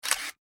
technology camera shutter release nikon f4; Electronics

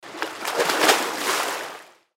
Swimming Pool Dive In Splash Indoor; Pools

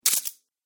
Treasure Coins Drop; Cartoon Sound Design

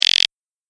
Robot Buzz Tone; Robot Sound Effects; Sci-Fi Robot Sfx; Andrew Fleming

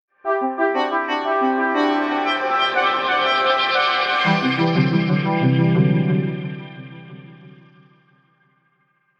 Scifi Audio Logo Synth Pulses Happy Sequenced

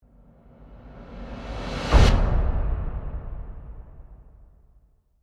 Hit, Dark, Crescendo, Airy; BlastWave

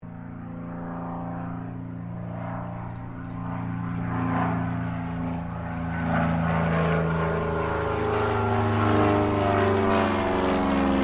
AIRCRAFT CT4A LOOP, military trainer aircraft; Airplanes; Vehicles

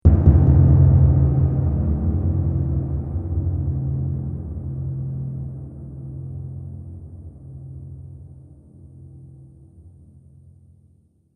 Drum Hit Trailer; sound design

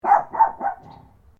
Animal Dog Chihuahua Bark Triple; Various domestic dogs; Animals

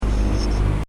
Sci fi machine loop, Scifi Machine: Loop, Short; Control Panels

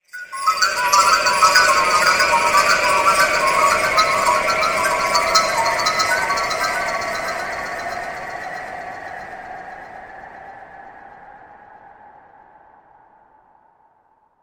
Spirit Of The Chimes Haunting, ghost sound effect

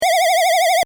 Retro ufo loop, SFX: Retro UFO Style Loop; Spacecraft Sound Effects; Spacecrafts (Sound Effects:Science Fiction); Stuart Duffield

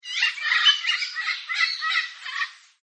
Festive Parrot; Birds; Animals

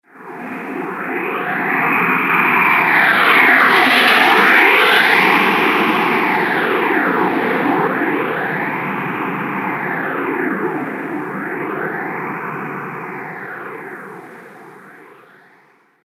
Intergalactic Starcruiser Flyby; Spacecraft Sound Effects; Sci-Fi Sound Effect; Andrew Fleming

